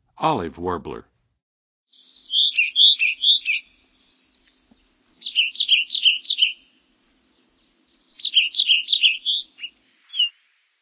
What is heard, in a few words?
Olive Warbler, Songs of Birds of North America; Western USA; Western USA Songbirds